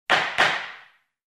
court session two blows of the gavel in the courtroom